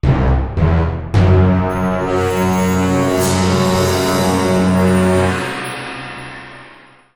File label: Level Up